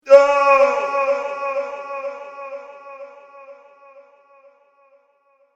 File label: No Man Screams With Echoes; Yelling Sound Effects; horror sound effect